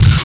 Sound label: menu select; Tony Hawk's Project 8; XBOX